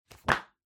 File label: Magazine Close